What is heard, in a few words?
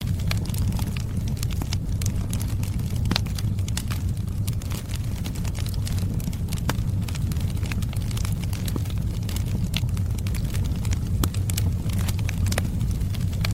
fireplace